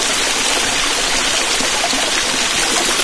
water fountain loop; ambient; Left 4 Dead 2; Source Engine

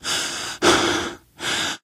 exertion minor, survivor voice, manager; source engine; left 4 dead 2

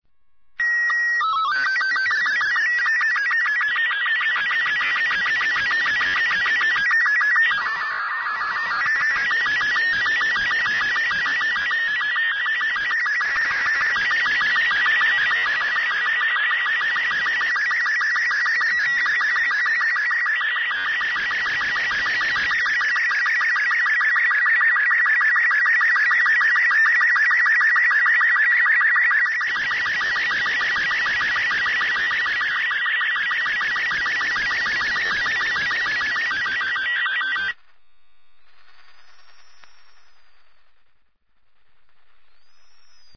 ambient; Portal 2; Source Engine; video games

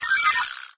robotz asterisk; windows 9x; sound schemes

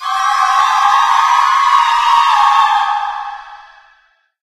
loud angry little witch, music; Left 4 Dead 2; Source Engine

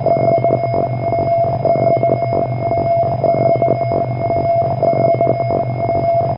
inside radar, ambient lair; video games; Source Engine; tf

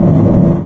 pontoon scrape smooth, airboat; Source Engine; video game; vehicles

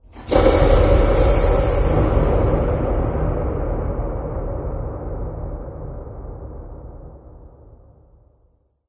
amb rock fall, ambient organic; video games; source engine; portal2

